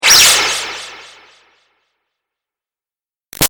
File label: Laser High Echo Out; Sound Design; Lasers; BigRoomSound